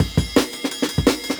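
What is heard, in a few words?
Jungle Breaks, 170 bpm; Harsh; Original Jungle Breaks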